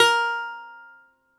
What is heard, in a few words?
BALALAIKA, Russian Strings